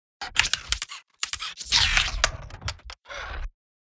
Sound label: ROBOT SMALL